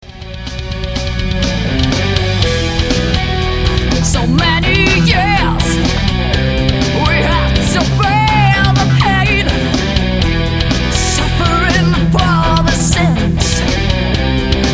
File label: children